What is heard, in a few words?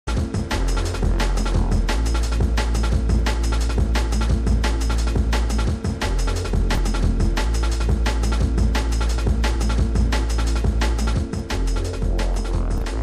Drum and Bass Jungle and Old Skool, UK Bass Radio